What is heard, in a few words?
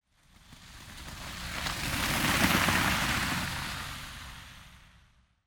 Car Drive By